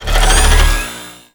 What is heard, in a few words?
Mech Sword Heavy Charge; Nechro